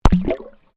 Water Microphone Dunk Submerged, recorded in a domestic pool; Various water sounds recorded in a domestic pool; Splash Sound Effects; Splashes